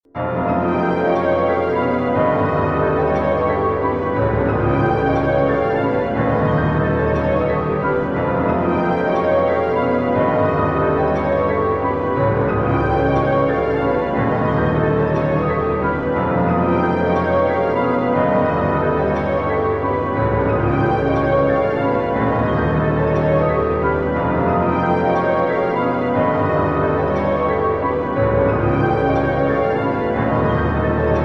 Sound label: 6edo groove; Removed low piano and strings, added wood blocks and greater dynamic fluctuation